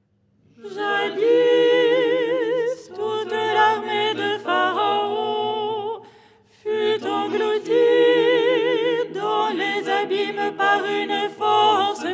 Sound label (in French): soprano